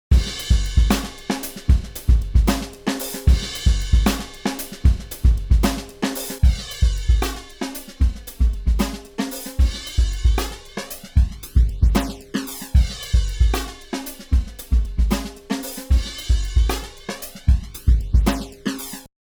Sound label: Jazz Drums